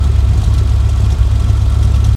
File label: Gelik Idle